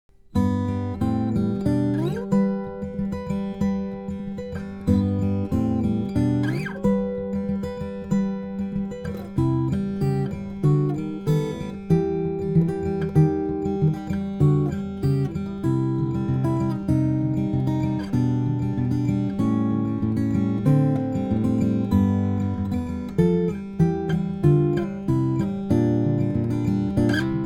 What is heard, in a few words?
finger, guitars